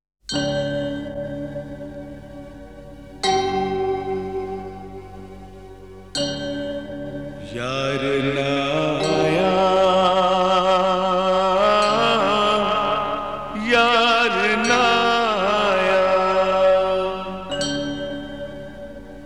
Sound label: Male Version